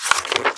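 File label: book page; The Elder Scrolls III - Morrowind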